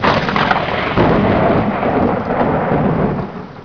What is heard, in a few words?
thunder; The Tempest